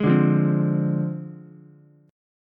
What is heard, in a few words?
F Minor 6th, Ukulele; JGuitar